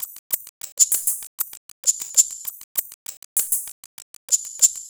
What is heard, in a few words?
Hats, PercussionElements